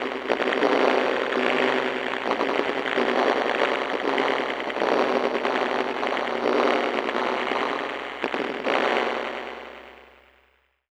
lofi scifi; Transmission-X

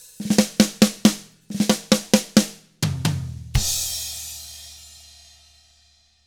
SLOW ROCK END, Drums; 66SLOWROCK

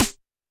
Snare, Roland TD-20

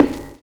CABASS; Univers Sons - Kitch 70s

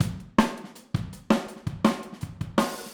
Overhead, Drums, 162 BPM; Verse; Overhead ST; Drums from the Big Room The Mixes; Take Your Toms Off

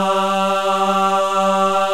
MALE CHOIR

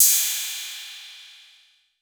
808 CYM; Wizoo - Platinum 24 Electronic; 808 SOFT P2

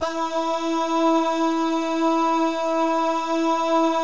BAH GUY, Vocal Planet Jazz & FX; ILIO; BAHS GUYS

